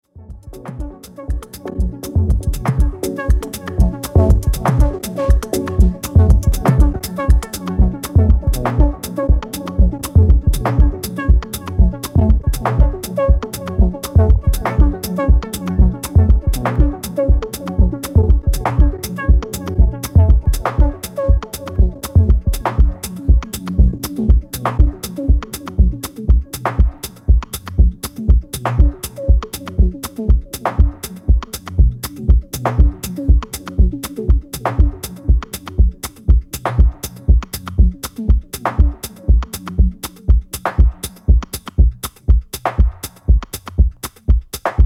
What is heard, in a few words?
Ambient Track